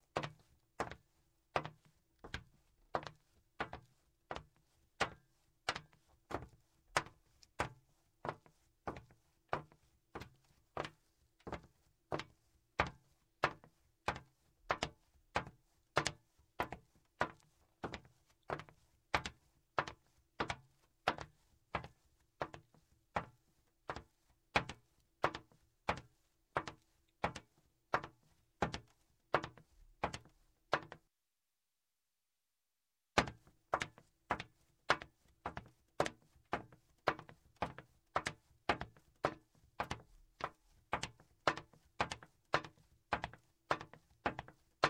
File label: Footsteps, Metal - Female; Sound Ideas 2000 Series